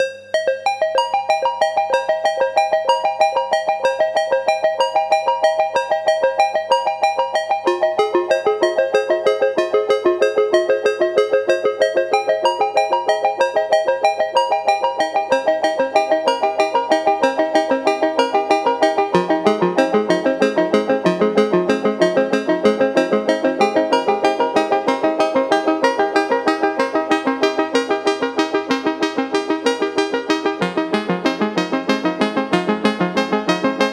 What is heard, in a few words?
alarm, ringtones; sound of oo website ringtones